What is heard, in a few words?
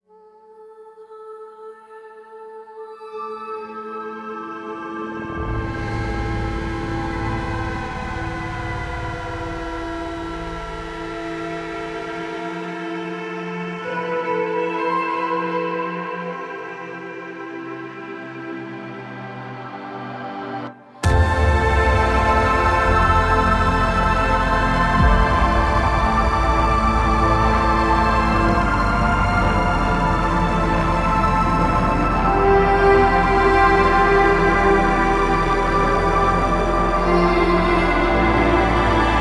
Electric Cello Version